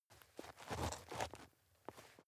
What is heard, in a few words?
step dirt heavy boots scuff soft; menschen; schritte